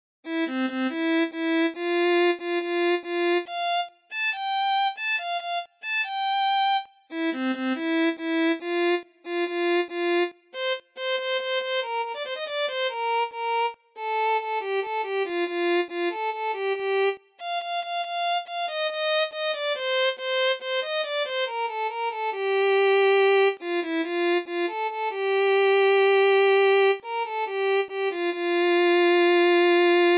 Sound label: Violin